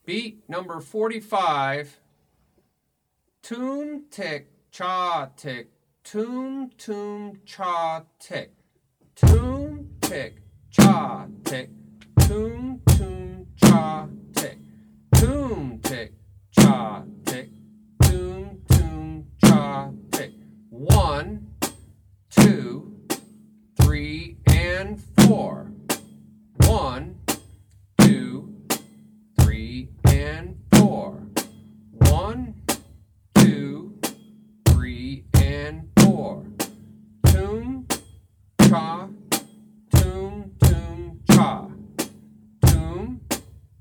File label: Beat 45 1 2 3 4 Toom Ti Cha Ti Toom Toom Cha Ti